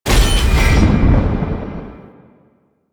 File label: Void Dragon Glaive Pickup; Enemies; Teshin; Warframe; Duviri